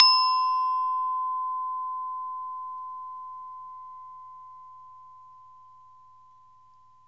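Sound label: SARAN B4, Metalophones, Pitched Percussion; E-MU Producer Series Vol. 7 – Old World Instruments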